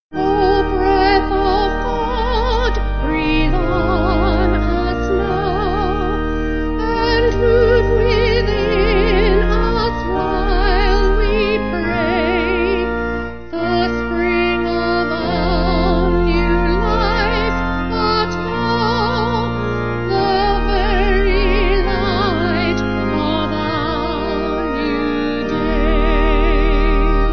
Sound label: Vocal Organ; O Breath Of God; Whitburn